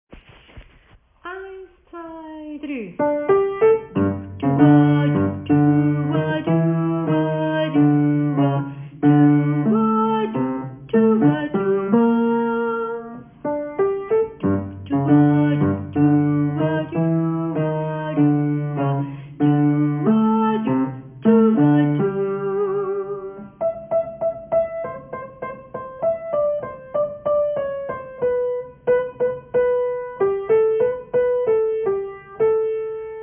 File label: Bass; Konditorei